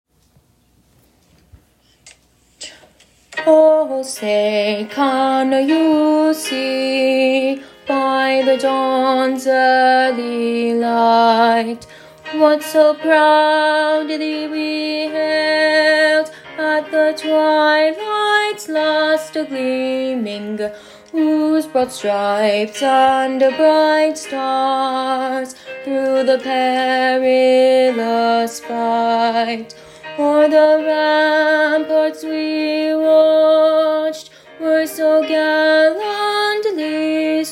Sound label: alto part star spangled banner national anthem alto; patriotic songs